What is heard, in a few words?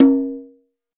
Conga Hi; Roland BK-5; Percussion